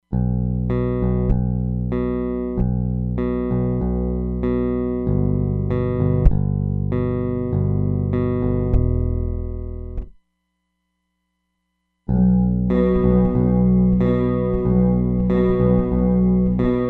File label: Chorused Pan Gate, Roland DEP-5; Factory Patches